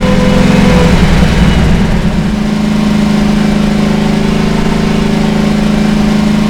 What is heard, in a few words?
jeep rev down